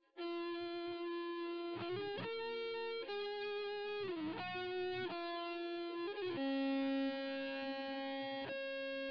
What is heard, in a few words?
nodrums